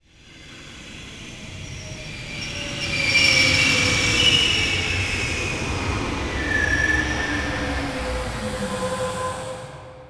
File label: subway